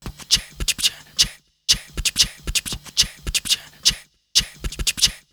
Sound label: Beat Box; Sonic Foundry (Sony Creative Software) - Fluid Dynamics Drum & Bass; Construction Kit